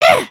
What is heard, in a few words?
headspin, emotes